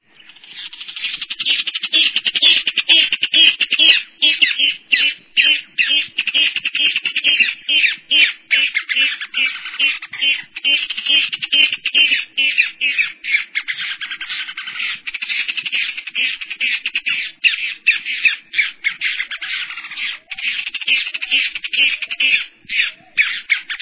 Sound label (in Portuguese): casaca de couro